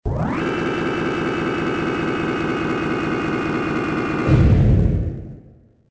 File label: powerout; Soldier Of Fortune II Double Helix; XBOX